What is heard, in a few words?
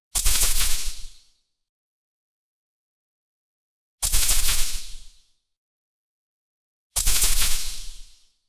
fast air swoosh whip crac; whip crack